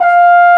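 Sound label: French Horn mf F2; Digital Sound Factory Virtuoso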